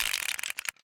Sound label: spin Button Click; narcos